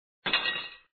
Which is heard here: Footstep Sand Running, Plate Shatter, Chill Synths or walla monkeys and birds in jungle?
Plate Shatter